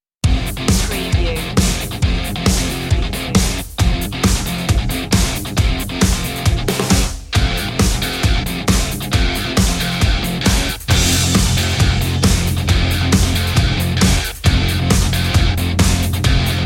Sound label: metal meltdown no sfx no vocals